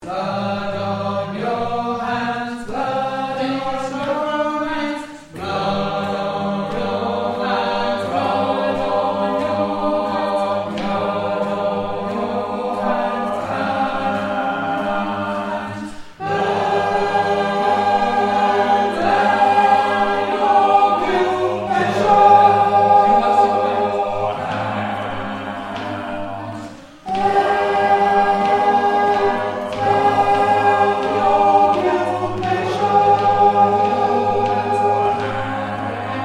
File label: blood on your hands All with end